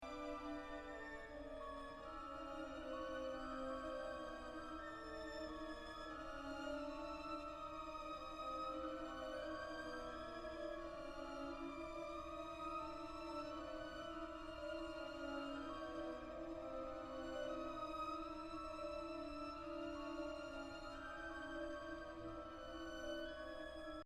Music for Strings return of theme; Bartok

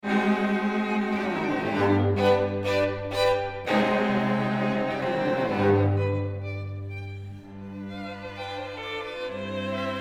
quintet C; schubert

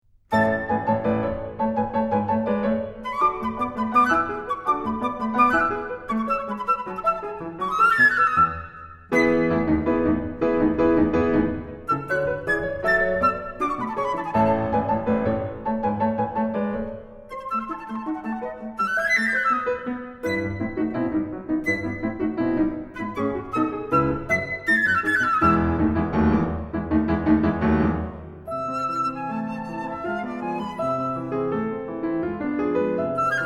Flute Sonata opening; Poulenc

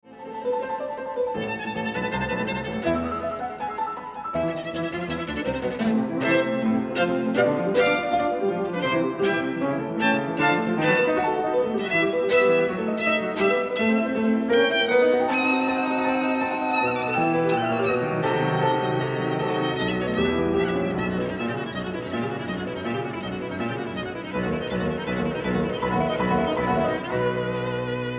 violin; beethoven

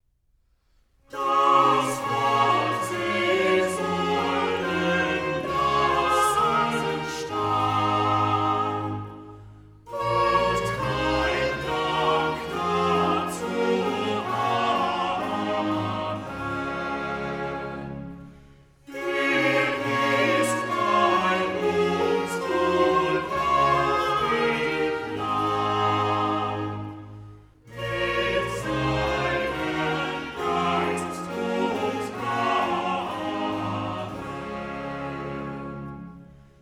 chorale; bach; das wort

